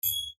风铃

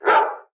狗叫声

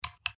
开关音效

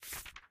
翻页音效

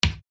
黑板音效

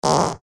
放屁音效

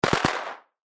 噼里啪啦

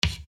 粉笔音效

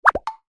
滑稽音效

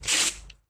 撕作业

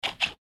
走路; 蛋仔

